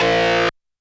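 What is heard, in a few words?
Guitar Power Chord L; YAMAHA RM1X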